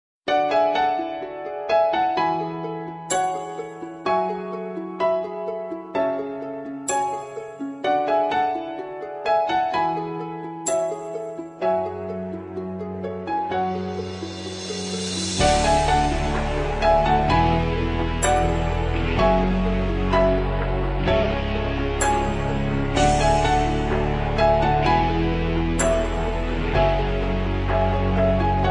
Piano; Inuyasha

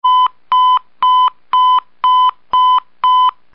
Motorola Alert

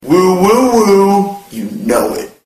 Woo Woo Woo; meloboom

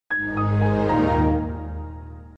Windows XP Shutdown

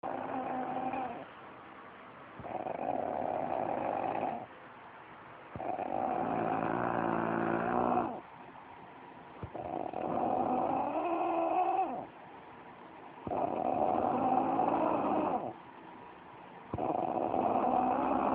Snore

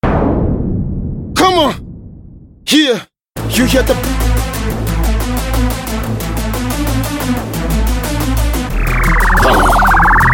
robot rapp

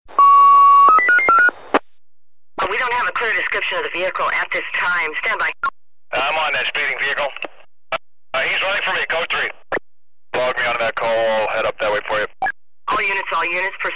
Police Radio

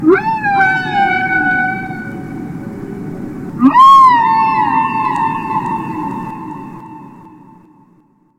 Whales singing

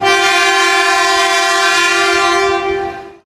Shinkansen Horn

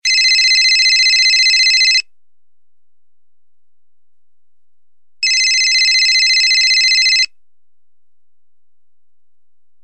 Ringing

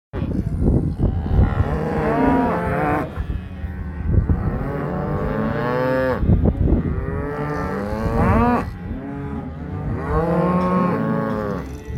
Cows

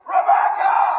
Yelling

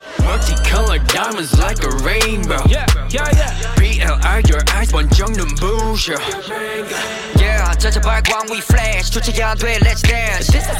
Rap

